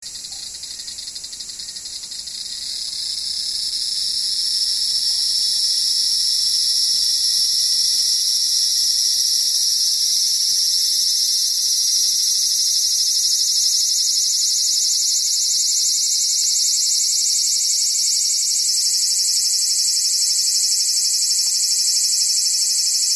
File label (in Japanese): aburazemi